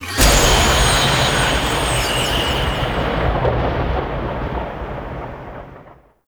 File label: Shrine Drop; Eidolon Plains Rainalyst; Warframe; Teralyst